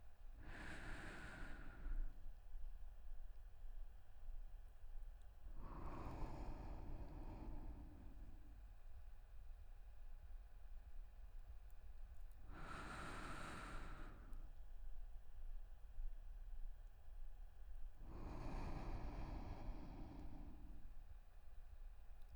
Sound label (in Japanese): 寝息